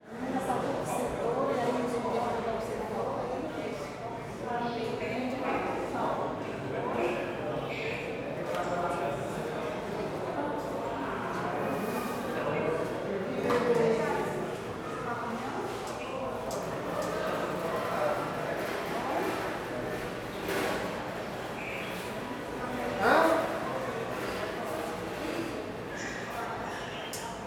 Ambiente no corredor de hospital publico vozerio; multidao

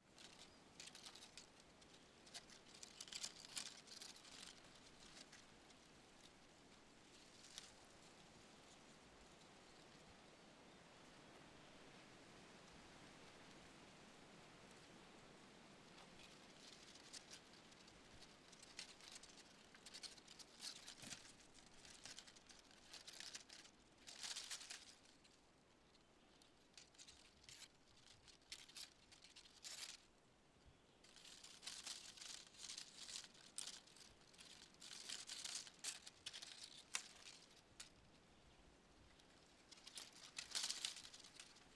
Folhas secas vento arvore cerrado mata

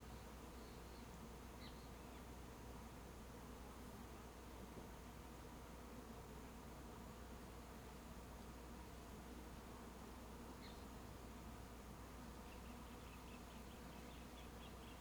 Abelhas em ambiente rural com passaros e grilos

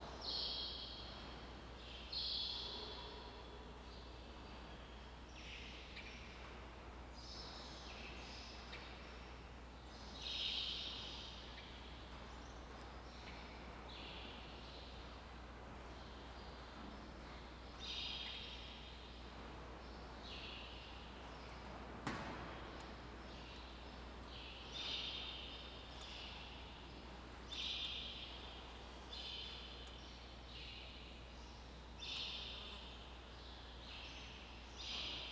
Ambiencia galpao com passaros; ambiencia rural

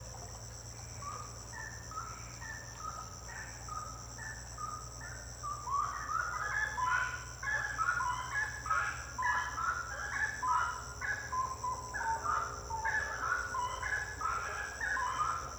Passaros cantando a noite canto intenso; aves; Coleção Sonora do Cerrado

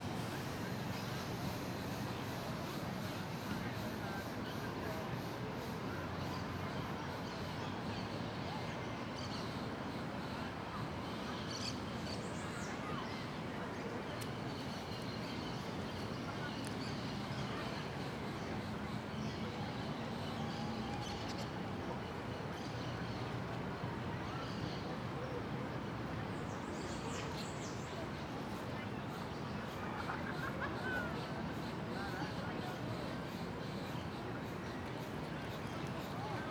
Ambiencia parque flamboyant a tarde passos na grama, cigarras, pessoas; ambiencia urbana

